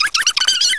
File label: hit; SHELL